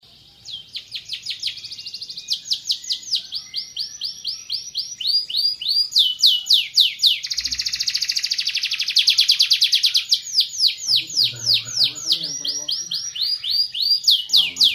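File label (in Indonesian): kicauan kenari, canary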